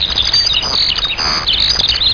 Chirping skylarks

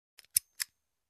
Quite and soft gun reload sound

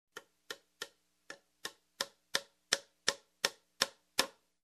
Hammering a nail into a wood

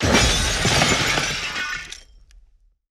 Shattered Glass

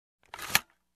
Camera Projector Shutter Sound

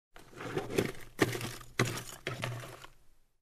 Firefighter fully equipped walking

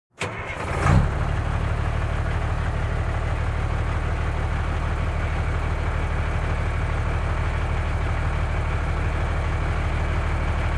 Garbage truck engine start

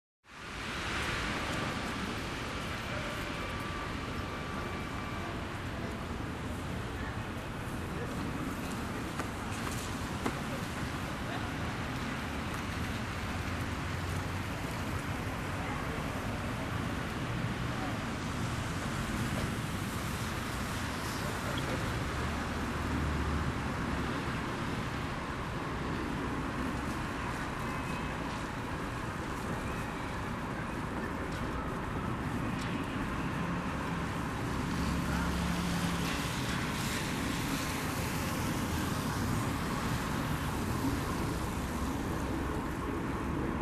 Wet Street after summer rain

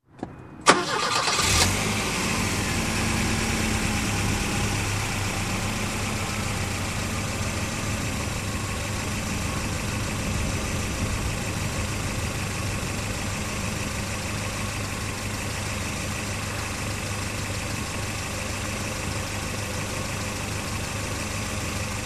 Car Engine Ignition running idle

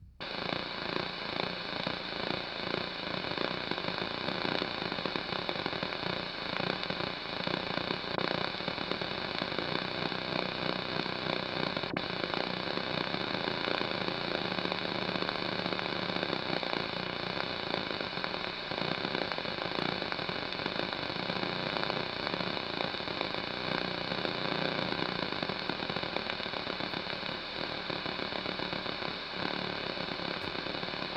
TV Static Noise

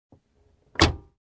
Closing Small Car Door

